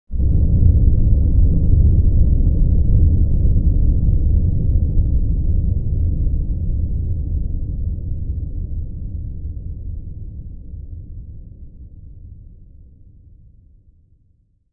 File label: Blast Rumble; blasts